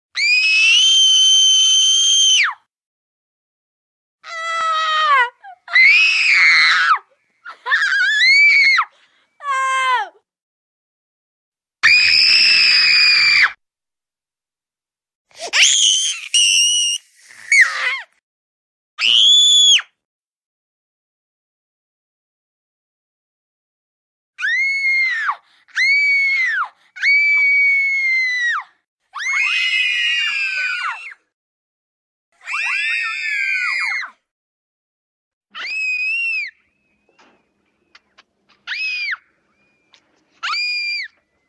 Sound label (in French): bruitages sons de cris d enfants peur horreur; Compilation; Pour montage vidéo